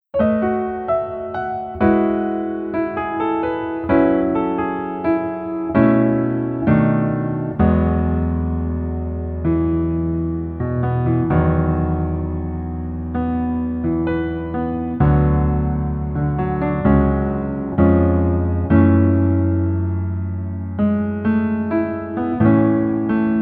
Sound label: Piano